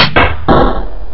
Beat box close highhat; Instruments

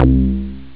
Bass; SynthSamples2

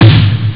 snare; quar

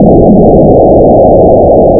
crash; Games Galore 3; Asteroids

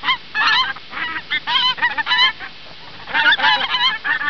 Cackling Geese

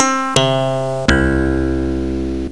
slap low; DPaintIII demo1; instruments

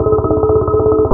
alarm; Games Galore 3; Asteroids

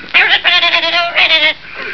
This Parrot is ACE!

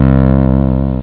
Bass; SynthSamples2

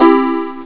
Chime MAJ; AMOS MUSIC 1

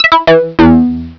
Syn Guitar; Instruments

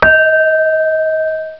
cheer; Asteriods

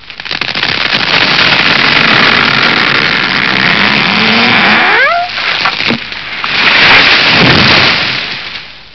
Falling Tree

